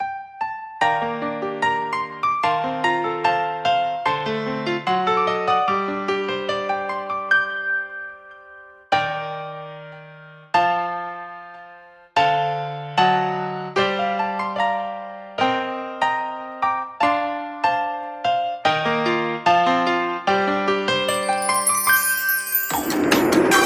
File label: instrumental